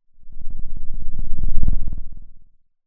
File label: Sound of a lion’s roar